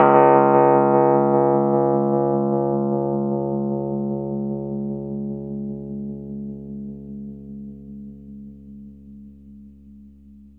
Rhodes Db; 3D Sound; 3D Keys VOL-1

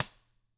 TAMA SNRD; ILIO; Double Platinum Drums 1

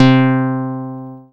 BAS MKS-50, Roland

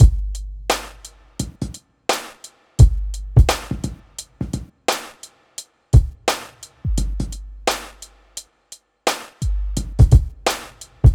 Drums, Hip Hop

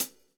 123 groove; AMG Gota Yashiki Groove Activator

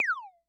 Multi, Vermona DRM1-MK2

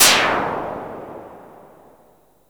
NOISE SHOT; Best Service Alpha Dance vol.2

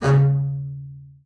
C#3, bass spicc; Xsample VOL-5

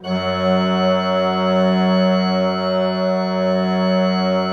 PLENO F2, Cathedral Organ; Propeller Island

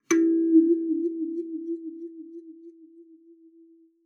KALIMBA E4; Xsample VOL-7

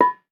BONANG, Bronze Gamelan; E-MU Formula 4000 Series Vol. 4; Earth Tones

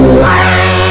STACK08, Big Beat Samples; AKAI; STACKS KIT

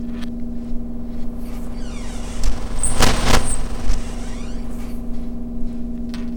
loop, techno atmos

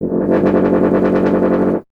BRS TUBA, Solo Instruments

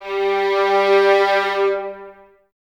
Violins Detache G 3; Miroslav Vitous; 11 Violins

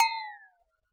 glock fx; Univers Sons - Raricussions

